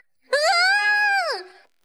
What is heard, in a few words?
screaming